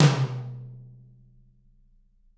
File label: TT, Toms; Bob Clearmountain Drums I